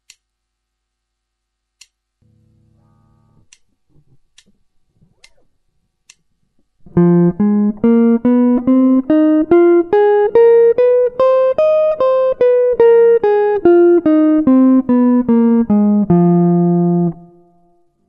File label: guitar